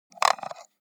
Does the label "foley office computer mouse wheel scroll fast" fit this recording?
Yes